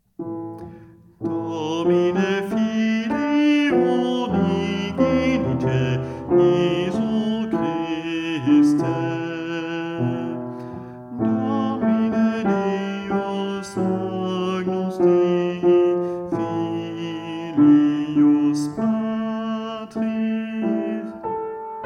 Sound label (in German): Bass